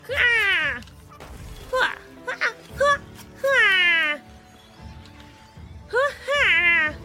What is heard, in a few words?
battlecry; vtuber